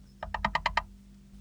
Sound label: red eye call